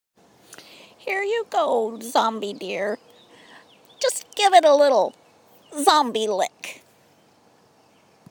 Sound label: little zombie lick